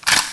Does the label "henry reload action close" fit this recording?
Yes